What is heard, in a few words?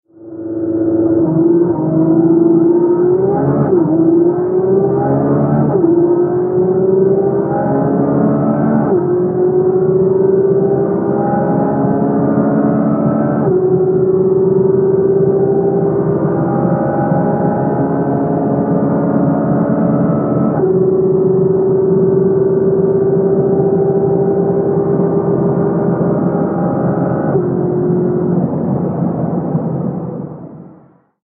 Engine long; alfa